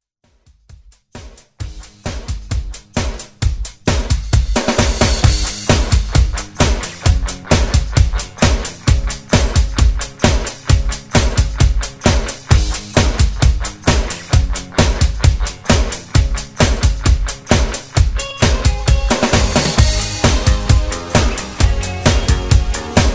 80s Pop Redrum

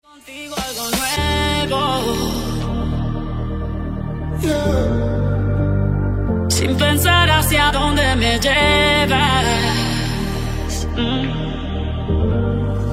Latin Redrum

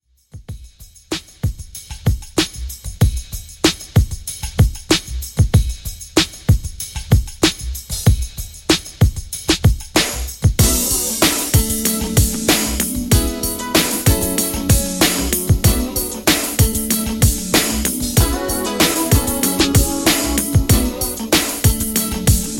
Classic Redrum 95Bpm

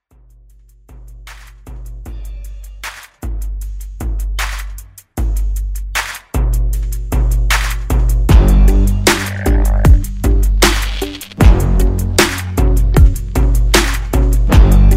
Pop Redrum

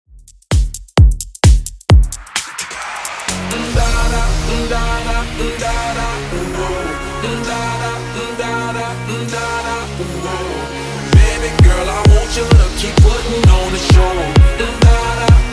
Redrum 130BPm

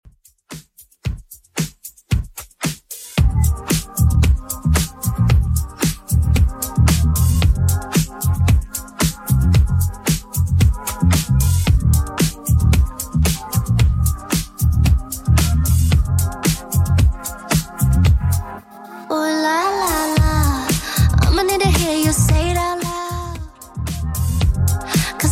Top40 Redrum 113bpm

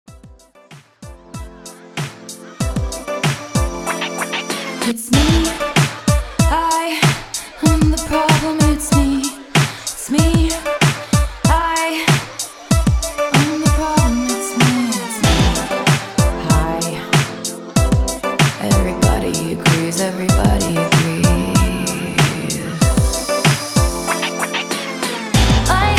MASHUP 95 BPM

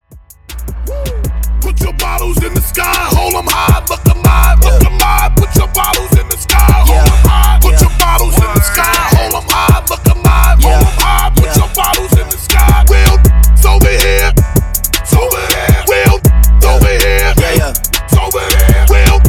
Hype In Outro 80 BPM

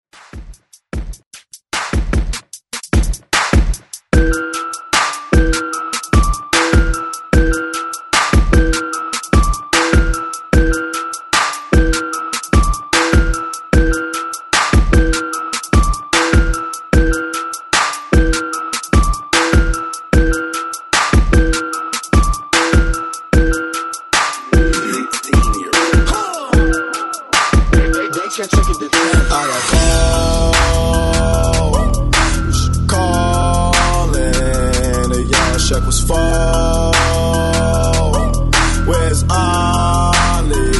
Redrums

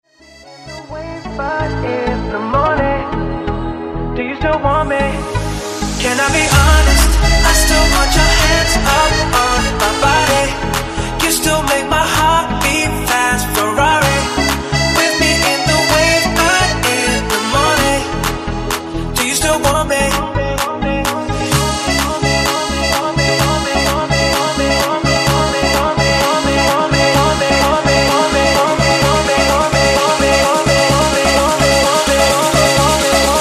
Top40 Bounce

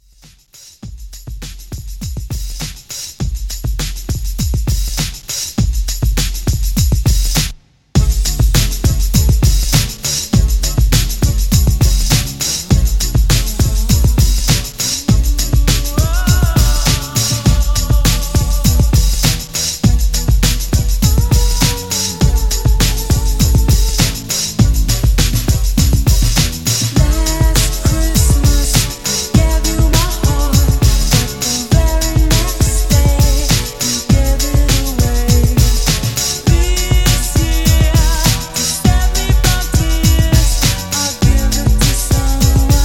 Re Drum 101

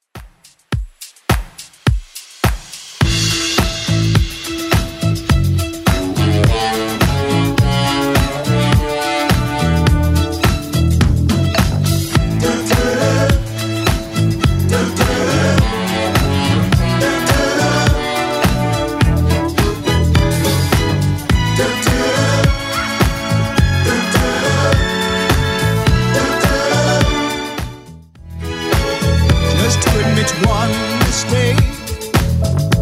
70s Dance, 105bpm; Redrum

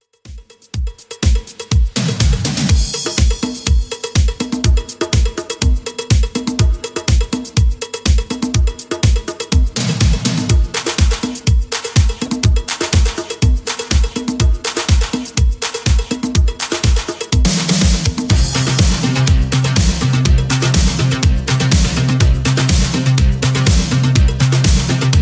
80s Redrum 123bpm

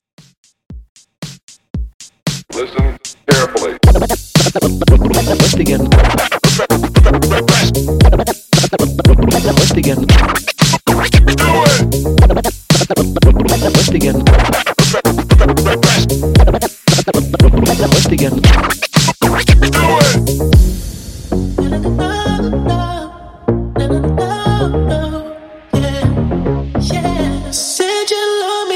Scratch; Tranny 115 97Bpm

